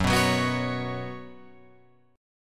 Gb Suspended 4th Gb down Guitar Open F, Gbsus4 chord; strummed; JGuitar